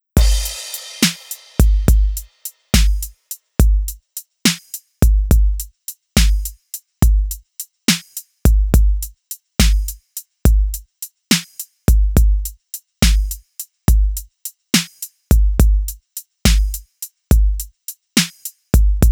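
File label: love no brass drum; drumcomp; By Burak Sound Bank; mixed vol 2 evrensel karisik temalar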